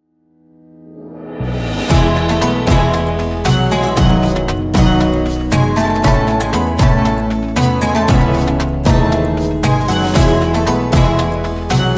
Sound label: Ramadan background music